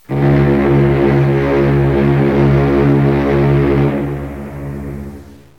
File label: Long Blast